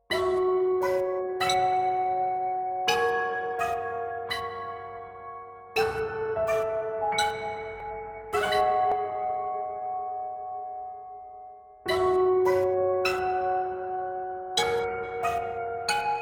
piano; Gachitale

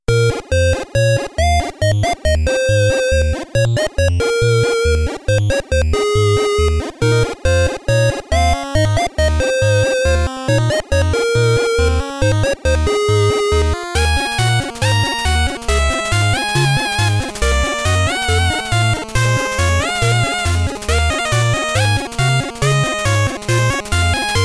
Creative Exercise, 8-bit Chiptune; Mario Paint

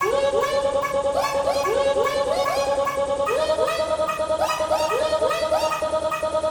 tension; Gachitale

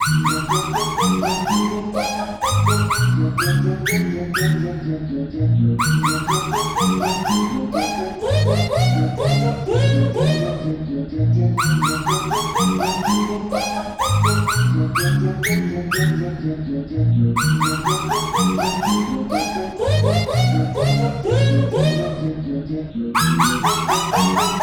gameover; Gachitale

